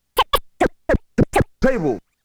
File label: Scratch